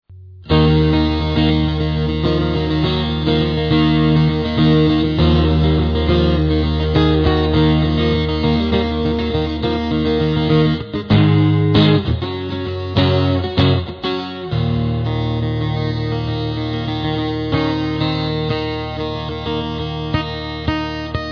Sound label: harley thunder